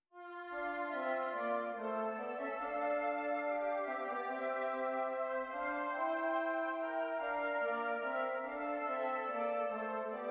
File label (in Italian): esercizi di doppio contrappunto nella decima; Ronge pagina 173